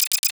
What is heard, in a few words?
Briefcase Launcher Homing Projectile Warning Locked, Weapons; Warframe; Corpus